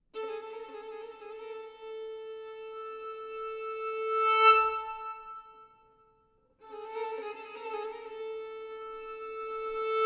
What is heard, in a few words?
Tense, volatile